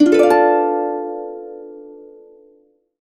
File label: harp chords, B dim7; USB Soundscan vol.62; Complete Harps; AKAI